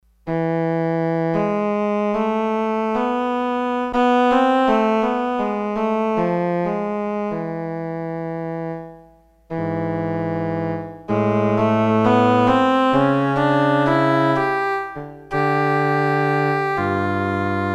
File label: Cellist; Factory Patches